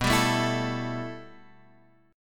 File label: B 7th Suspended 4th Sharp 5th, Guitar, Half Step Down; B7sus4#5